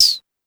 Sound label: Shaker Down, Pitch Env Various, Clavia DDrum; Clavia DDrum-AT